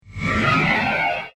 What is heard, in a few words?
Glassy Future Horror Transition; Scary Soundscape Sound Effects